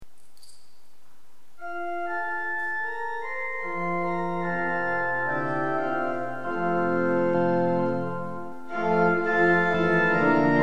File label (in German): Gloria Lied Orgel